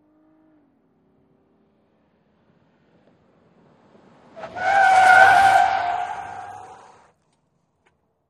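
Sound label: SUV Truck Accel Skid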